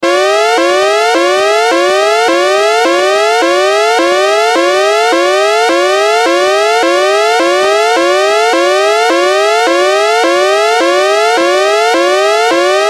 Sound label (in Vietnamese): Tiếng còi cảnh báo có kẻ trộm xâm nhập